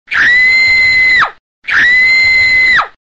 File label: Woman Screaming Sounds Car Horn